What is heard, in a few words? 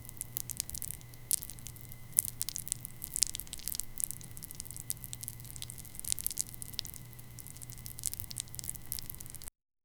transparent burger asmr, crunching